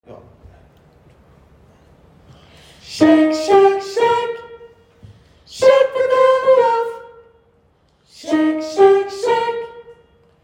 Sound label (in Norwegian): sopran, gospel; kongsberg; kor; gospel for alle